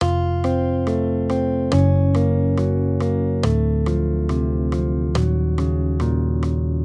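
Accompaniment, Major Triads, F-Gb, 140 BPM, guitar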